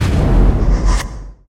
gorilla Mask Flies; gorillakingdom

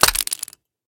spin button

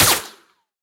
drive By Impact Hole; narcos

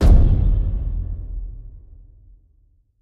random Wilds Land; conan

